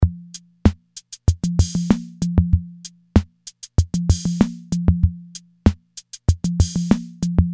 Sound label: 16beat